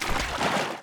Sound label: slosh, footsteps; Garry's Mod; tf2